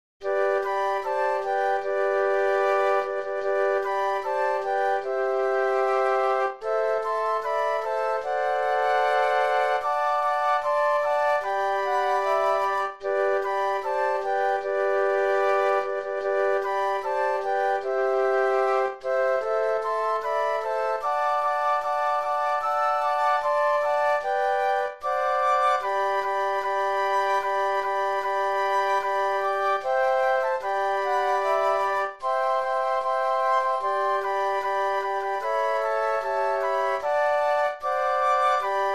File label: Flute Quartet; christmas